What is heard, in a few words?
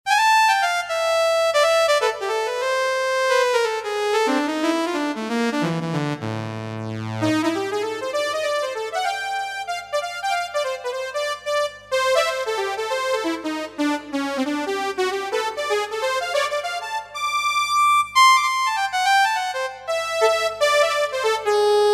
Synth Celeste